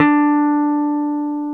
Jazz Guitar; 90's Sample CDs; Club-50 - Foundations Roland; Jaz-Kessel

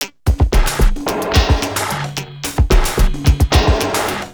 Beat, Industrial

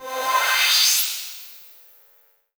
Virus Noise

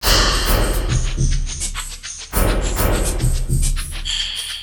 PLAS LP, Industrial Loops; USB Soundscan vol.24; AKAI

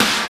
snare BEND, Snares; Roland L-CD701; Snares 5; Sn Modules 5

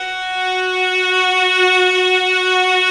VIOLA F#; 3D Sound - 3D Keys VOL-1

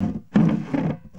LITE BOX, Box Noises; E-MU Producer Series Vol. 3; Hollywood Sound Effects

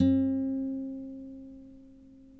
NYL NV D, nylon no vib guitars; Spectrasonics; Hans Zimmer Guitars VOL-1

